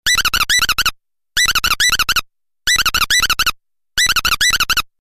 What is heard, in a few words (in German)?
girlitz, Vögel; midi; Polyfonisk musik; siemens